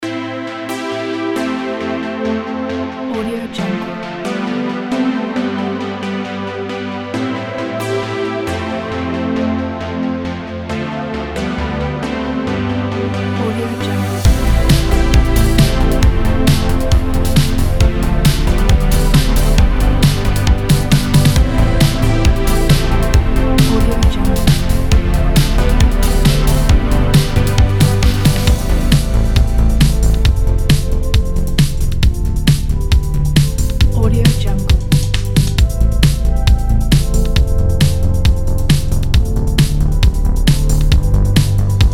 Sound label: determined retro synthwave